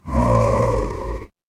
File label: horror growl